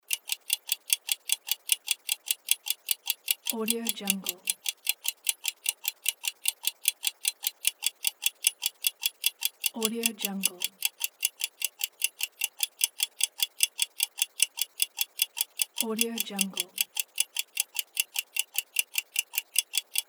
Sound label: a clock